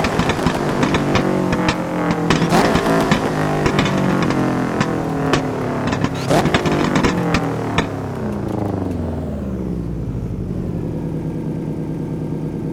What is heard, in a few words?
slowing, vehicles cars; subaru BRZ; lwcars